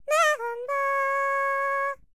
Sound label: HIMALAYAN, World Voices; ILIO; Vocal Planet VOL-5